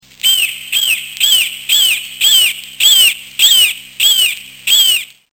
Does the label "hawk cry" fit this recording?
Yes